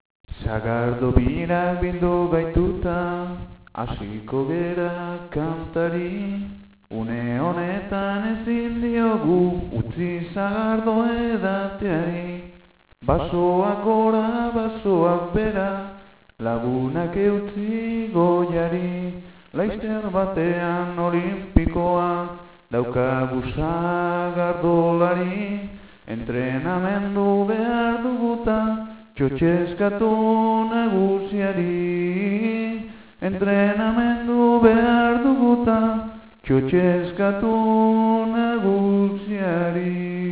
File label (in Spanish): canta; Sagardo-Birak